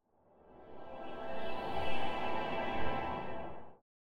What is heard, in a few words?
Swipe